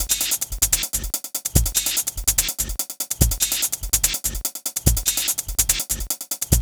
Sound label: Fast Hi-Hat; Sonic Foundry (Sony Creative Software); Club Joints and Street Anthems